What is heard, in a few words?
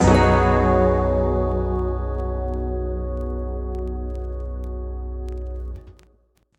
Retry Jingle; Friday Night Funkin' Vs. Bob and Bosip